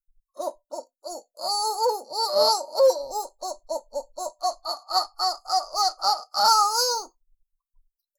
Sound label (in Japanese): お? おっ おおっ おほぉ…, バイノーラル